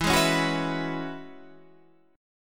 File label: E 6th Add 9th E, Guitar Standard; strummed; chord; 12,11,11,11,12,12